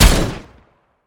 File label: weapon; SAR-502